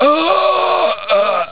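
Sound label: scream; duke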